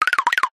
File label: cartoon wood knocks fast crazy